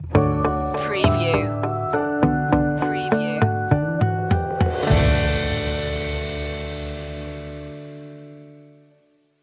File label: will it rain stinger